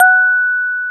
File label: DS8 F#5, Korg; Back In Time Records; Korg Universe VOL-1